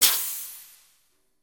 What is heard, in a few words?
air release; spaceship